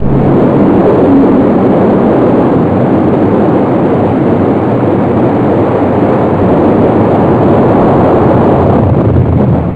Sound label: Dive; U–BOAT™AV